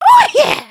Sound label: flex